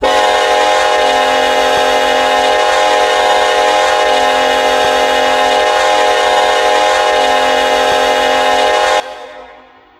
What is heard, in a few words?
k5lla, horn; run 8 v3 horn pack